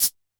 Cabasa, Standard Kit, Yamaha PSR-E323

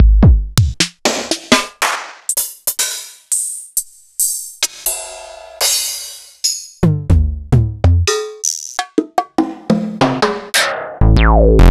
Drum 303; proteusvx

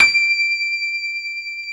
PIA MICRS, MICRO-PIANO, Roland

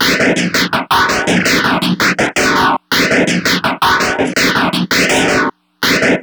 CRUNCH, Drumz Grooved; E-MU Producer Series Vol. 6 – Analogia Project

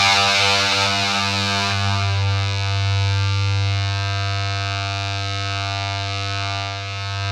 ZWEEP; Spectrasonic Distorted Reality 2

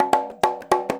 FILL, Percussion

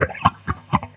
HEY, Roots Disco; USB Soundscan vol.32; AKAI

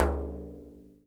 Frame Mute, One Shots, World Percussion; Sony CS

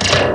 BLAST; East West; Global Sounds

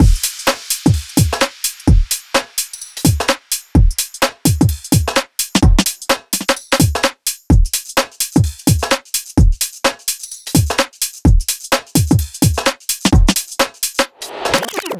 drum full loop girl

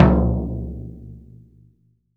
P.F2TOM P, VELO TOM

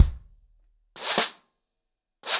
100BPM, ACOUSTIC DRUMS

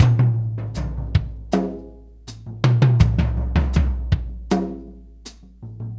Tom Groove, Laidback; Sonic Foundry (Sony Creative Software); Drum Tools

